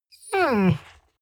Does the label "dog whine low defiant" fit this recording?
Yes